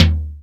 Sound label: SP12 TOM; Hollywood Edge; The Big Bad Beatmachine